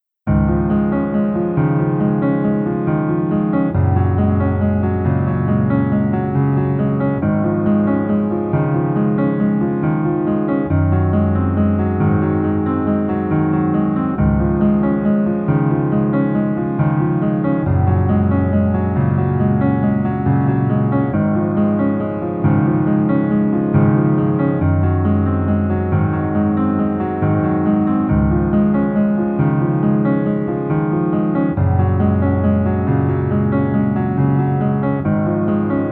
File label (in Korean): Piano ver 남자키; 이태원 클라쓰